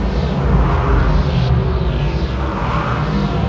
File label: teleport loop, machines; Quake 4 Alpha